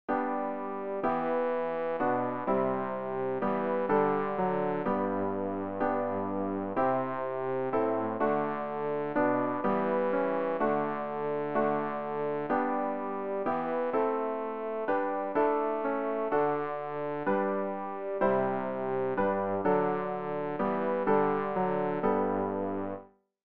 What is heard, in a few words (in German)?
bass; Ref. Gesangbuch; RG 568: Wach auf, mein Herz